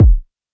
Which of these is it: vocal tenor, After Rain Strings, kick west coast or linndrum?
kick west coast